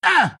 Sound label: pain; Alch